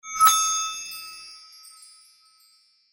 Legendary Item

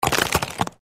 Freeze without Chime

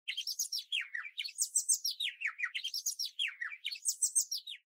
Dizzy; Wave n Dizzy

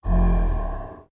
Bear Hmm

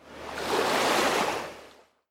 Wave Efx Slow

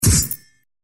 Spike Grow

